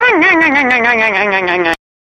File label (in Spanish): risa; calamardo